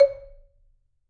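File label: C#, Marimba dead note; Sampleheads - Dave Samuels Marimba & Vibes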